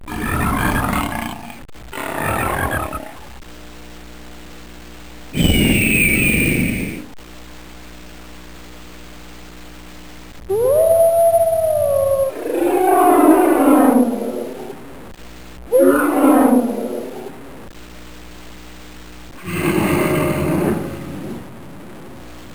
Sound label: Roars; Saban; Ninjazord